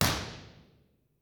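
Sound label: impulse response stereo; spring lane building university york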